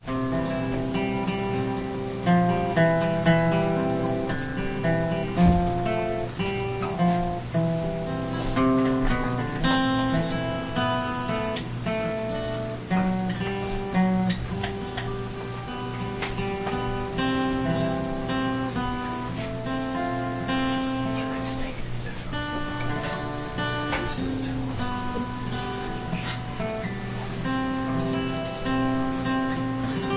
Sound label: Guitar prelude; A tender heart